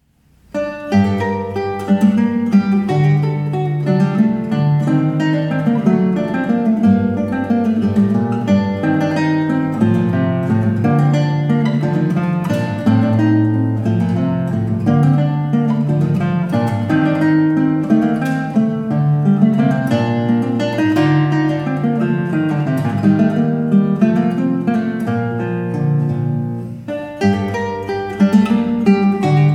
Gigue; Weiss

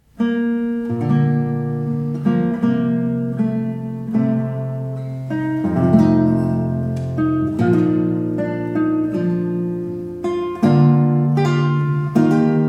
Sarabande; Weiss

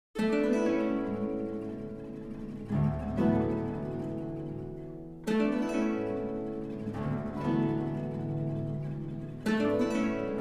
Guitar Orchestra, Preludes; 3 Preludes

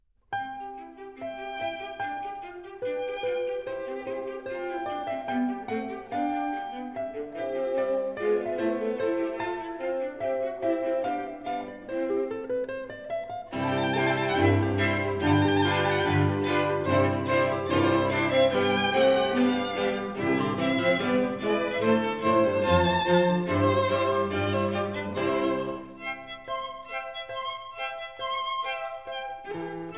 Quintet C major Allegreto; Quintet No9

